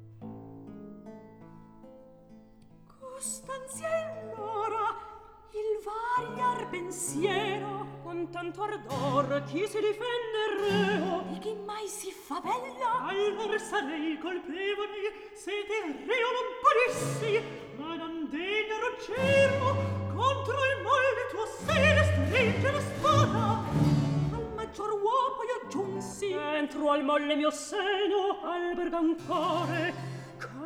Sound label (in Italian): Recitativo; Atto III; Scena 8; (Angelica, Ruggiero, Medoro)